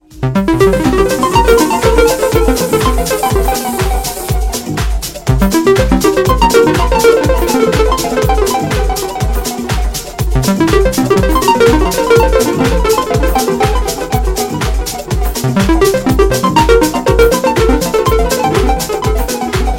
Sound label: Ringtone; Samsung Galaxy Note 10 Plus 5G